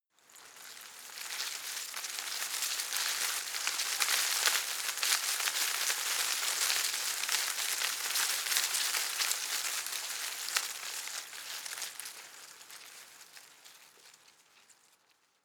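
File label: shrub small leaves rustle heavy, garden; haus-garten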